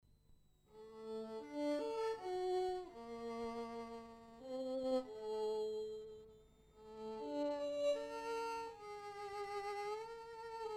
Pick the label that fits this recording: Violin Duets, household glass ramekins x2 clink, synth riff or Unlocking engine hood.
Violin Duets